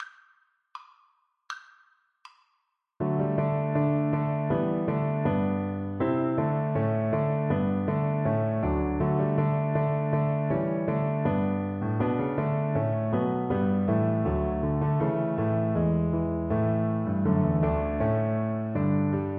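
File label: VLC karaoke, Cello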